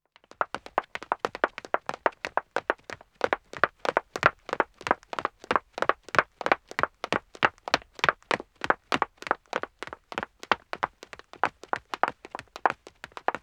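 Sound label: Wood (Dead Acoustic) Running 1 man, Footsteps; BBC Sound Effects Library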